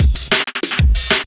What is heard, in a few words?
Beats, Synchro Funk; Junk; Sonic Foundry (Sony Creative Software)